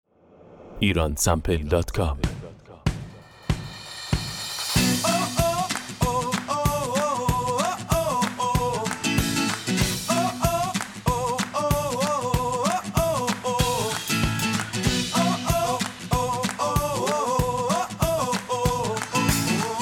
cymbals

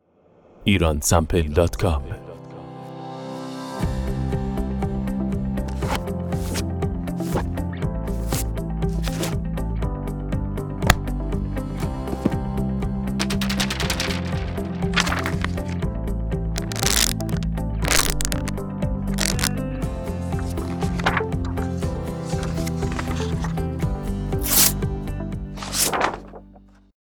Book Paper; Iransample

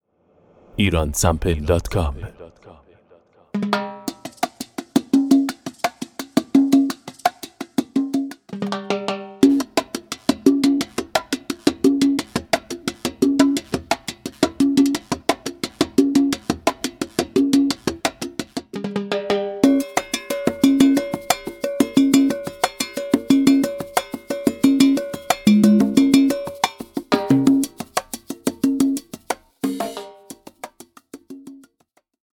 Salsa, percussion

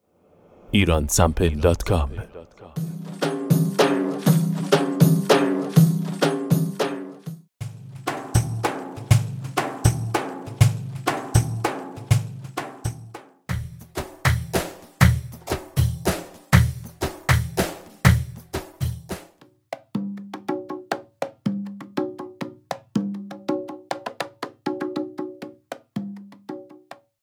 bastaki mahali, loops

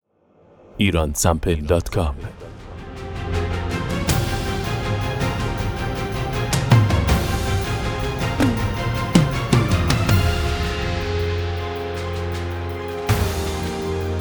Tom Tom

